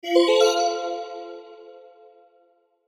CHIME MELODY; Chimes; Blastwave

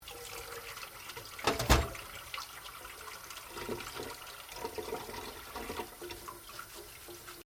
Washing Dishes Running Water

